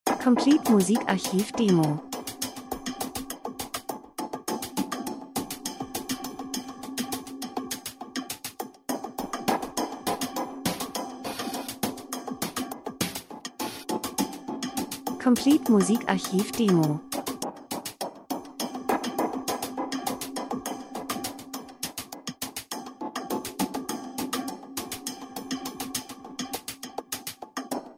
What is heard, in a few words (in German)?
drums, rhythmus; Elektro Pop geheimnisvoll einfach Lifestyle Positive Stimmung Urban Club Abend Feiern R & B Industrie Dokumentation